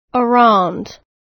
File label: Diphthongs